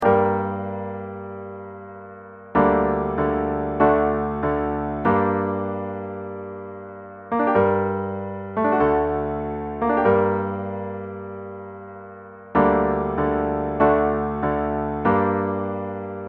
Accompaniment

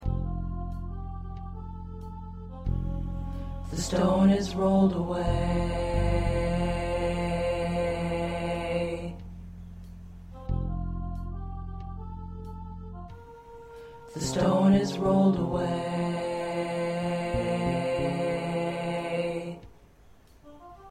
1st Tenor

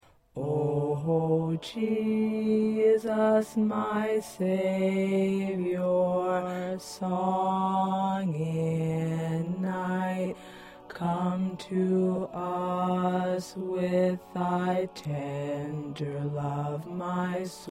Tenor, choir parts

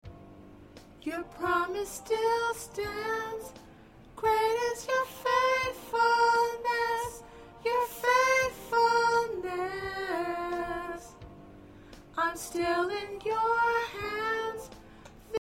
Soprano

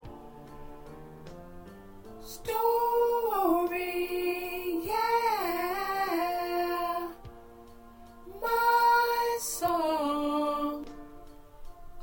Choir Alto; gospel songs; part preview; practice tracks

